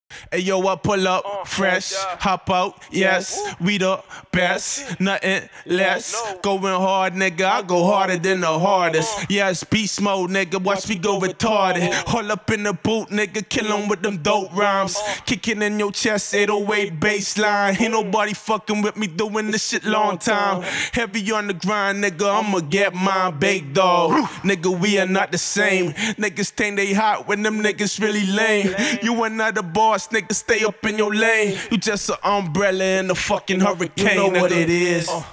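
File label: hip hop 170 G minor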